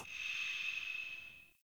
Stone Small Scratches

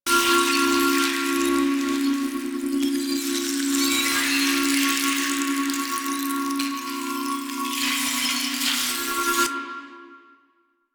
Citrine Protective Shell Loop; Warframe

